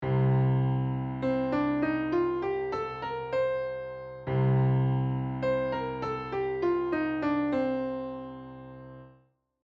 modes dorian

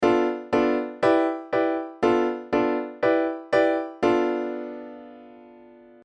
Locrian; Modal Harmony: An In-Depth How-To Guide

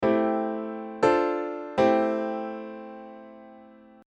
Aeolian i iv; Modal Harmony: An In-Depth How-To Guide

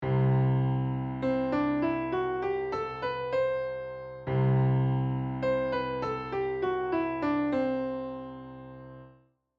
lydian, modes

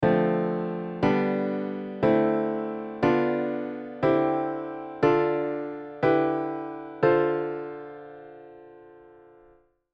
Dorian Root Diatonic Chords; Modal Harmony: An In-Depth How-To Guide

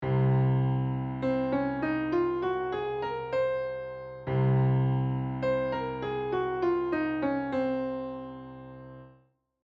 modes locrian

